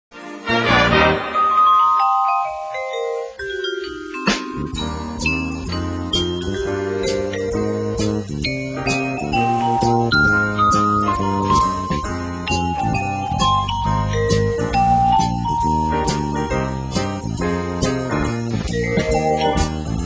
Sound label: vibes